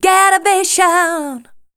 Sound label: voice spectral, ly short